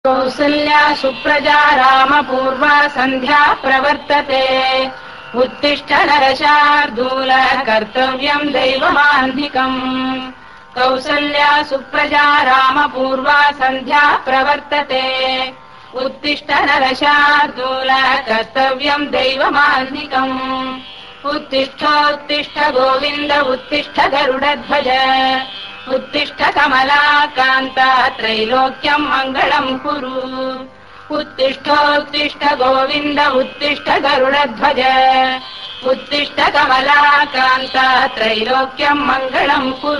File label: Venkateshwara Swammy Kausalya Suprajarama song Mantra